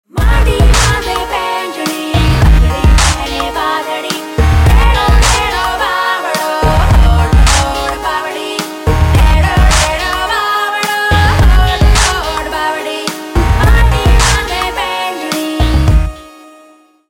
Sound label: music; Rajsthan Tourism Ad 2016